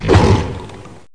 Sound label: attack boss; Repatitis3